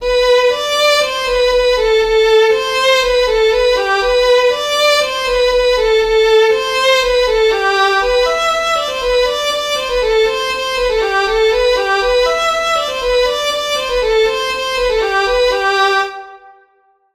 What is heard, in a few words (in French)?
polka piquee